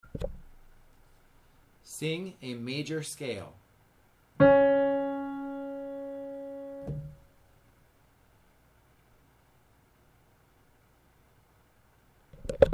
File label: Major Alto